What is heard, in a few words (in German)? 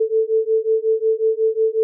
440 und 440 komma 5; F=D·x (D ist die Federkonstante)